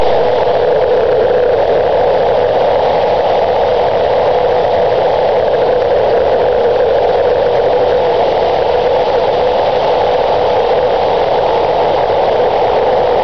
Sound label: Sprinkler 30 Feet Through Door